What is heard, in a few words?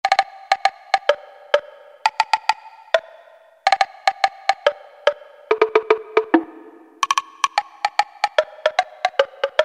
WOOD BLOCK; Latin And F.X. Percussions; Roland SN-U110-02